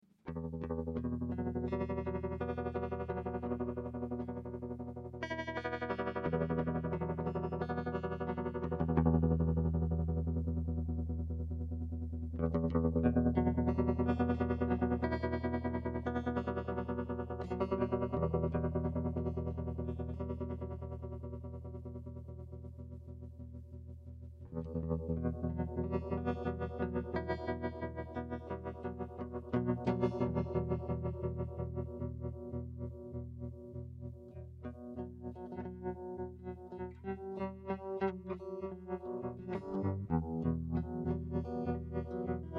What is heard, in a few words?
stereo vibrato square